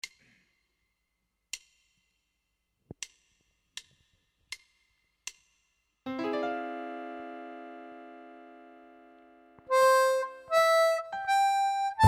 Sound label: C 80 harp